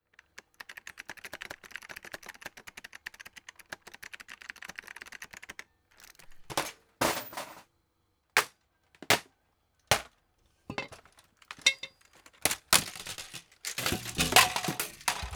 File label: bad keyboard things